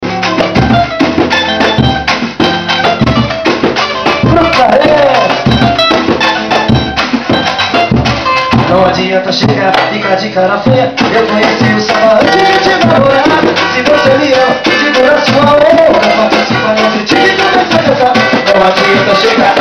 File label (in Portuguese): batucada ao vivo